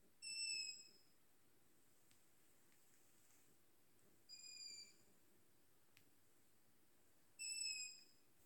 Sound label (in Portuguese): crias de bufo-pequeno